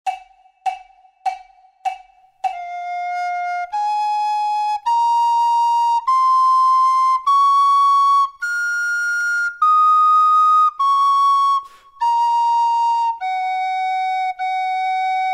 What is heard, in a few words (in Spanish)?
DUETO FLAUTA